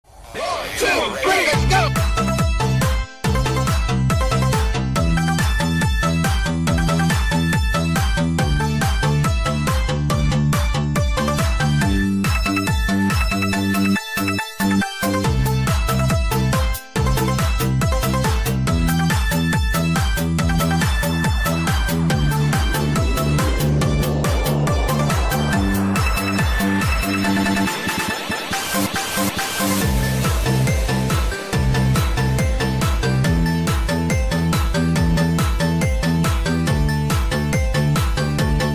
Nhac remix; tay du ky

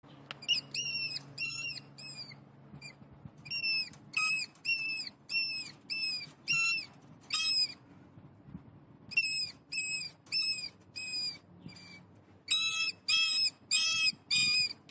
Tieng chuot can nhau

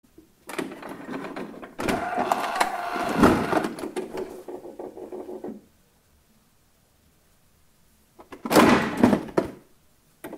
Tieng may photo copy keu

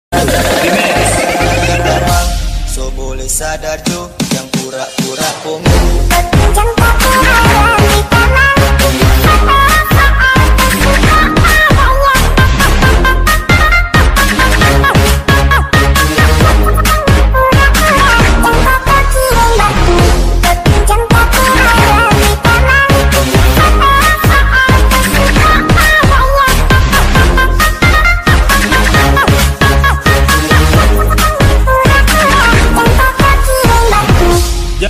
Nhac chuong remix; TikTok

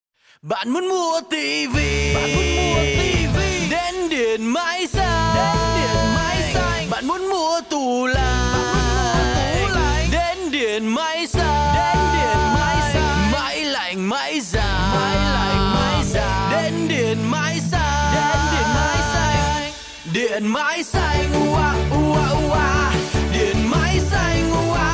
Nhac chuong; Dien may xanh

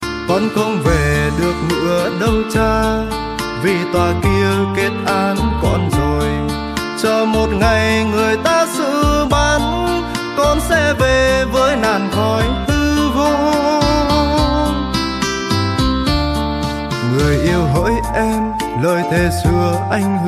Nhac chuong

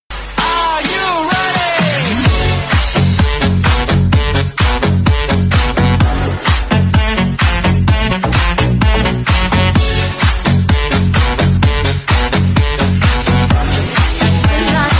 Nhac chuong remix

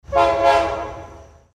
Tieng coi xe tai

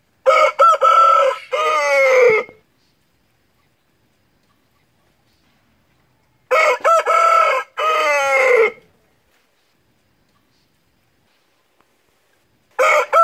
Tieng Ga choi gay

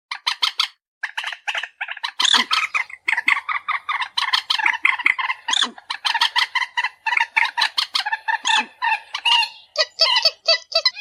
Tieng chim Trich Re keu

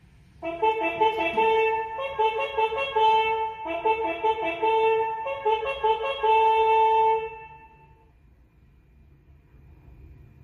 Nhac bao diem danh trong quan doi

